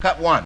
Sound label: VOX; Zero G; Man Machine